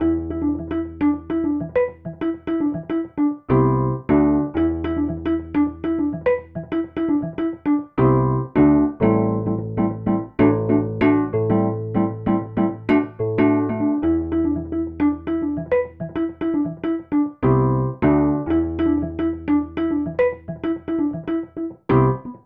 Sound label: Marim Wurl; morphing; pianoteq7